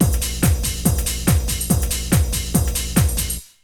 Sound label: Essential beats